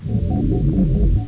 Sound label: save; slackware-3.0; games; abuse-0.31; sfx